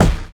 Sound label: Snare, drums; Sonic Foundry (Sony Creative Software); Drums & Drones; Construction Kits; Skip Kit